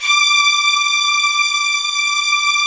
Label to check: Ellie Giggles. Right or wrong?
Wrong